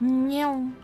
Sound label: nyaow; niphleim